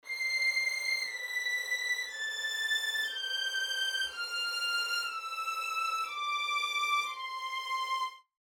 strings no effect

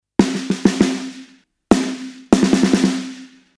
snare comp

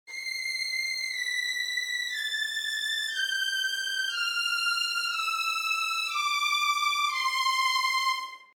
strings no effect

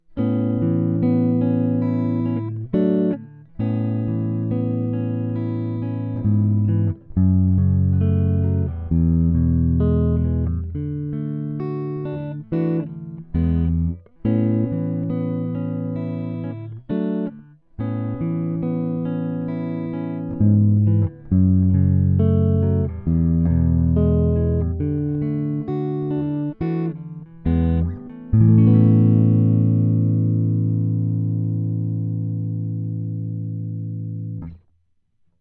arp only; test basic usb

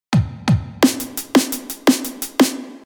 drum loop reverb

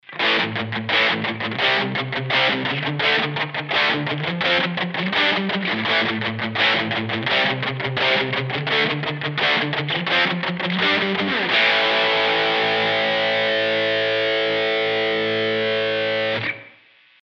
strymon riff on

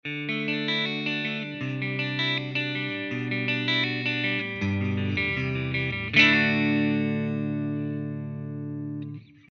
clean fender; BIAS

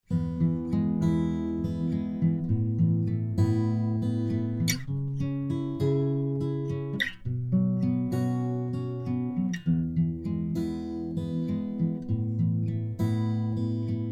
arp tape on studer

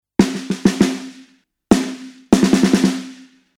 snare no comp

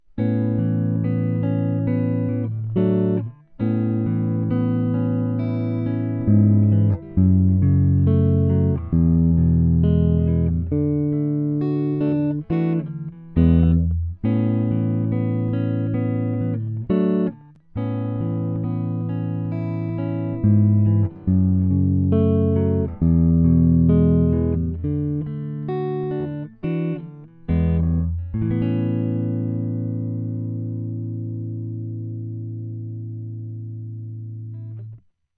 arp only; test oyaide

